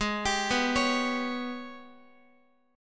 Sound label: G sharp Minor Major 11th, Guitar; arpeggiated; JGuitar